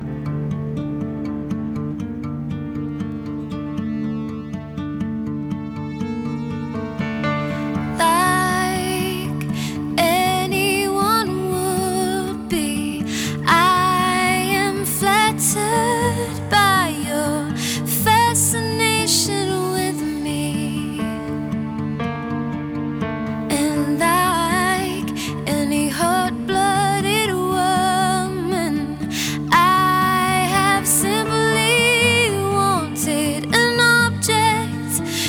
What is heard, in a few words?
acoustic mix; Chilled Acoustic; Ministry Of Sound - Chilled Acoustic CD2 (2010)